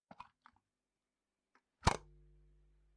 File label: gun reloading